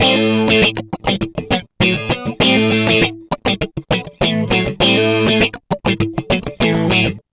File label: guit